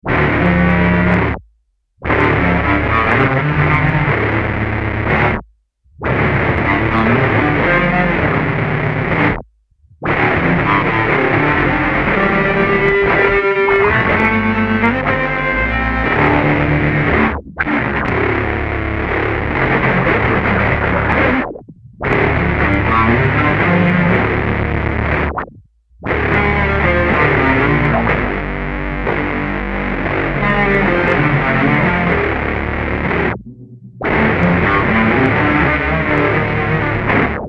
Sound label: Synth Sinus Short Attack